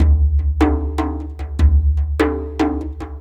Dumbek Big; Sonic Foundry (Sony Creative Software); Ambient Atmospheres & Rhythms

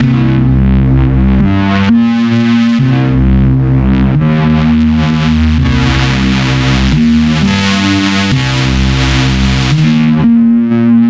Som Bass, Drum n Bass; KJ Sawka; Drum n Bass & Beyond

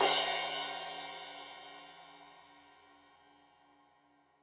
Crash 15in L edge, Cymbals Stereo; Deeply Sampled Drums

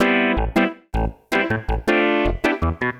Clav, Drum & Bass Keys; Sonic Foundry (Sony Creative Software); Knutrix Drum & Bass

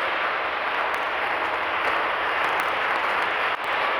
sparse soundscape samples; ReLooped

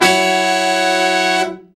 BRA SECT; BRA SECTIONS

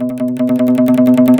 LUAN TRM B2, LUAN FX; Spectrasonics; Heart of Asia

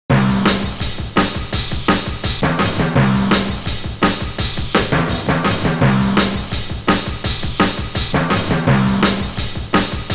breaks, Jungle; Zero-G Jungle Frenzy 2

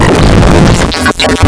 wavetables; waveedit256

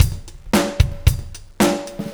112, Beat Loops; Crush; Zero-G - Monster Beats

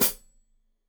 Hat Foot, Hi-Hat, Cymbals Stereo

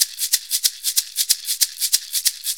Shuffle Perc, Perc Loops; Sonic Foundry (Sony Creative Software); Drum Tools; Shuffles

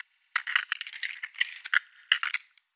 perc crackly matter; Polyend; Recue; percs glitches and layers

